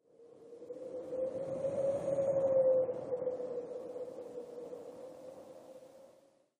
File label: Sound Wind; arcade-canvas; ninja-runner